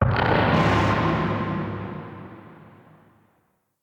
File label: Shotgun Tail; Weapons; Corpus